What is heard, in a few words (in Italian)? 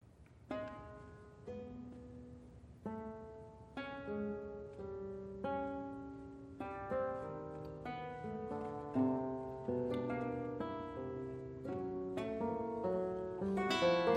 agitato